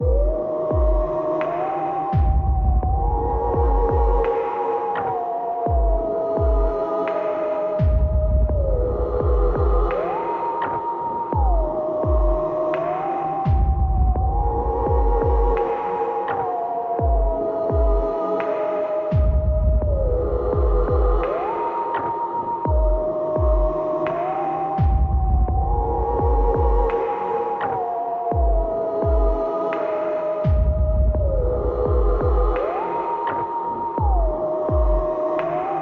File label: Follow Music Loop; Bonnie